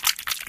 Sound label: Stroke